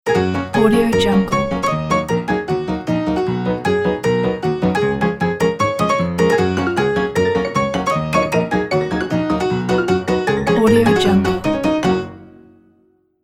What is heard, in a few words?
cheerful cartoon logo